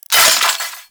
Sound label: Loot Container Break; Sentient; Warframe; Sentient Devourer; Ambience